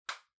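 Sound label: Ceiling Light (Far remote control Cover Close)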